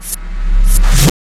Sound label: Reverse Fx; musicradar